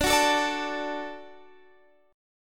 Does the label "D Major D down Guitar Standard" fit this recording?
Yes